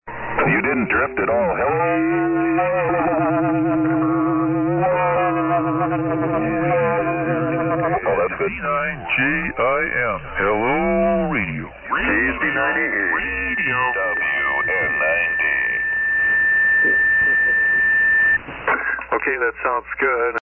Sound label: SSB Net Tune Up